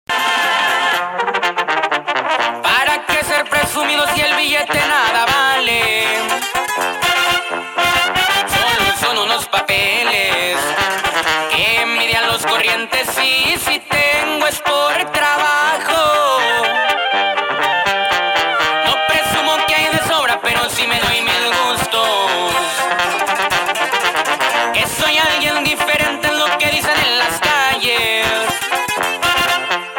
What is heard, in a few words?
Todos Hablan Mexican Ringtone